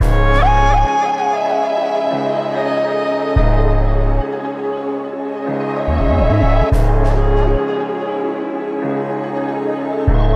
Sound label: 143 BPM C# Min (Drill), Melody; Cymatics; PARADOX II; Melody Collection